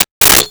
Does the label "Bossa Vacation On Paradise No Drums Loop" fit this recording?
No